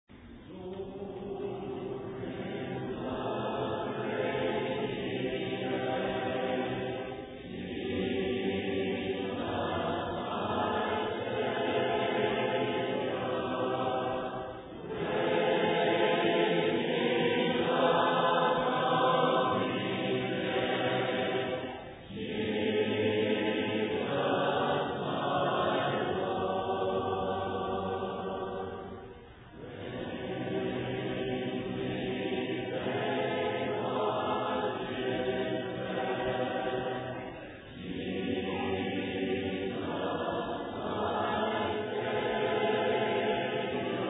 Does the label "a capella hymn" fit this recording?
Yes